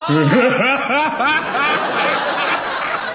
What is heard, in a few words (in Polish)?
smiech; Zombie